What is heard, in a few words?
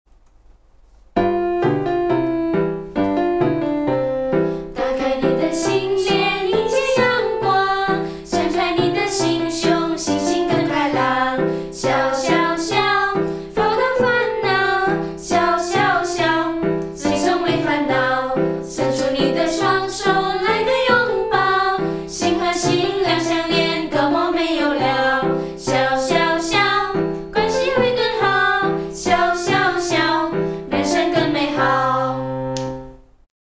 laughter; teaching aids; songs